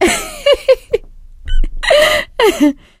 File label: laughter, survivor, voice